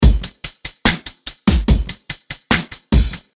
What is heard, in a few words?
Beat F 145